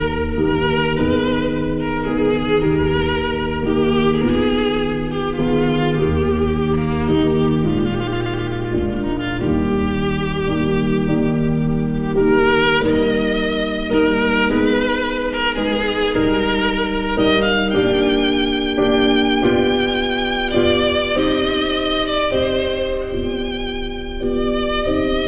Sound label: piano, Sicilienne for violin and piano